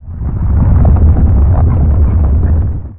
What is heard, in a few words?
rock; Tanks